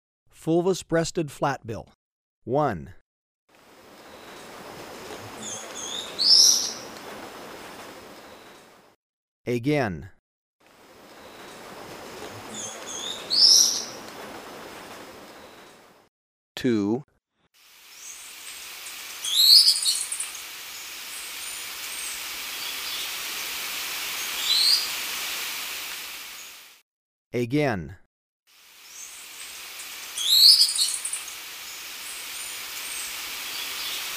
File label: Fulvous-breasted Flatbill, Birds of Ecuador; S. America